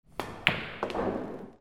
BILLIARD

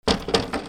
HOUSE

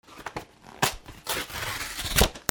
RIPPING

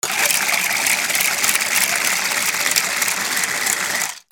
KITCHEN

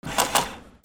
OFFICE

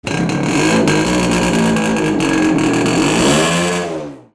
VEHICLES

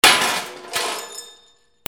BANGS

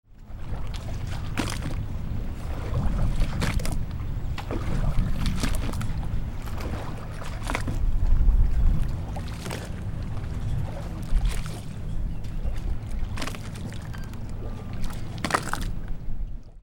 BOATS

